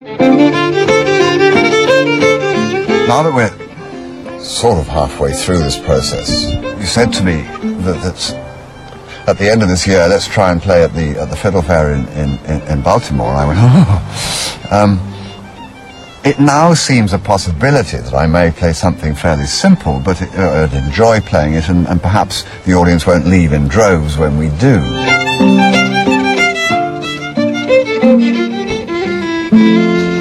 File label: audience; Faoi Lan Cheoil; Perhaps the audience won't leave in droves